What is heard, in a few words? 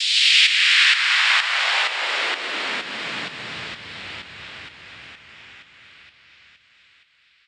XF Cym FX